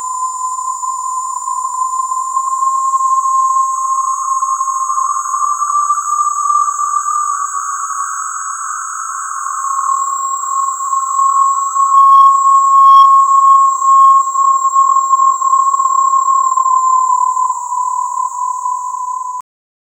harsh cicadas swelling; insect

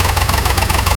two stroke karting engine

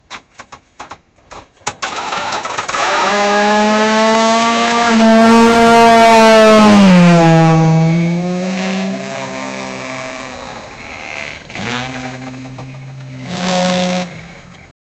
to make a combined car, engine sounds

